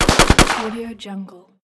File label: rifle shots